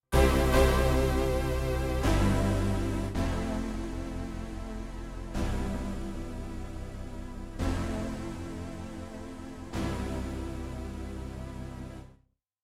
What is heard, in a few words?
Synth Excess, XV-88